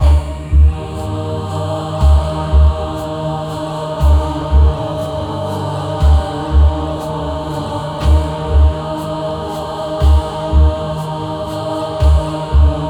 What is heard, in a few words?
futuristic action packed, choir